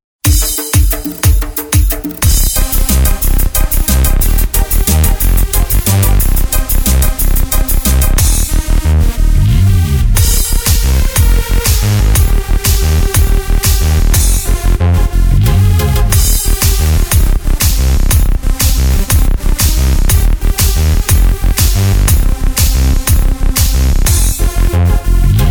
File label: Korg Pa3X